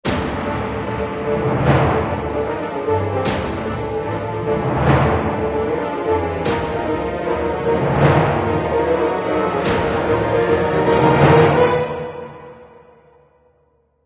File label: sting; The LEGO Ninjago Movie Video Game; PS4; Windows; Xbox One